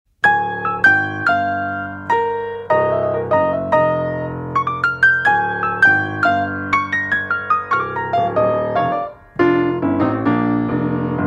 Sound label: (piano solo)